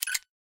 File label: pip appear; War Thunder